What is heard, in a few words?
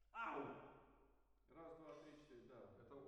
MKH AB, piano